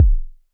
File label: sub, sub bass; Techno ID; subbassdrums